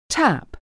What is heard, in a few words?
tap